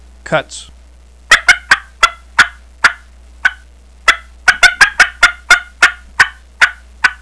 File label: cutts